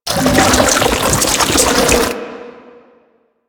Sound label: Proto Infested Babau Tentacle Flail Loop, Enemies